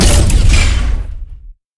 Stab Pre

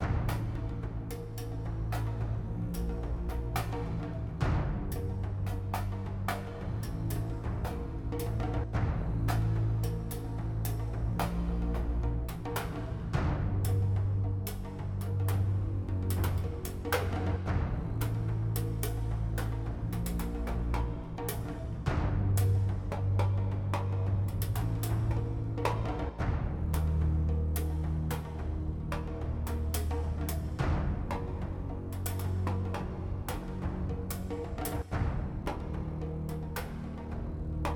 Creep Low

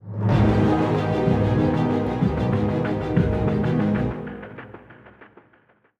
190bpm; Legends of Pegasus; windows gamerip 2012

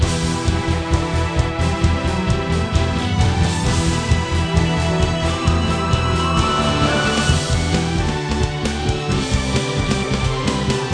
Battle Loop

